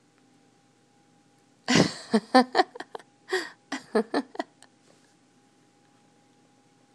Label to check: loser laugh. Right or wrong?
Right